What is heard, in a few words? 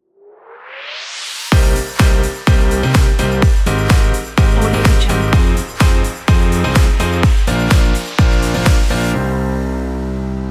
logo opener